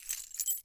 Keys

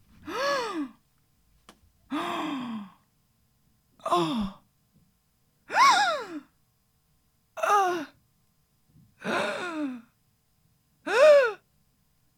Female Fright

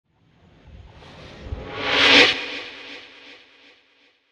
Reverse Transition

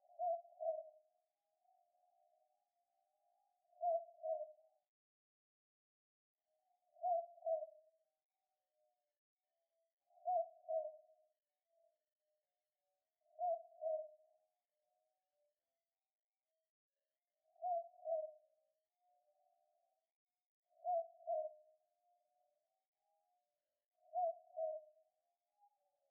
Boobook Owl

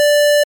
8 Bit Tone

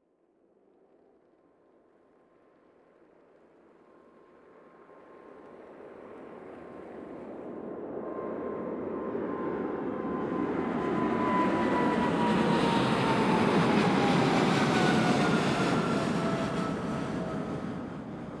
Jet Landing Flyover

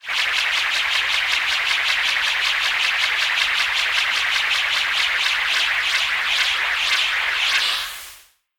Spinning Noise

